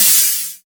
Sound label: Cymbals HH Open; Vengeance Samples